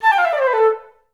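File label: flute run down A3; Best Service; Peter Siedlaczek's Advanced Orchestra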